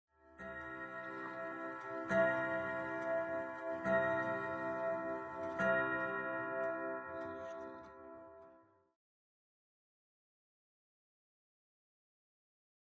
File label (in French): CARILLON